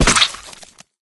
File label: attack